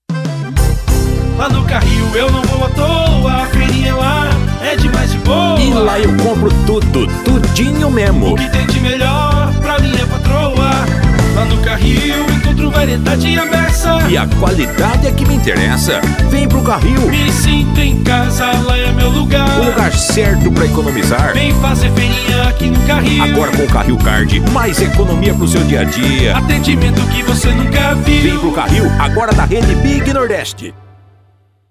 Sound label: Jingle; Mini Mercado Carril